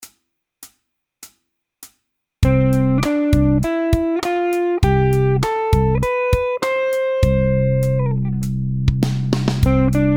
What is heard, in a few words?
Scale C Maj